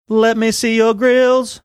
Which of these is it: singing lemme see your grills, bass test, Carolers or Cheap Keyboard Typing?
singing lemme see your grills